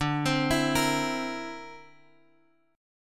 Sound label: D 7th Suspended 2nd Sharp 5th, Guitar Drop D